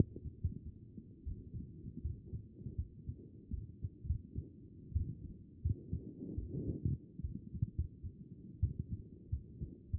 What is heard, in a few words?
CHEST; B MODE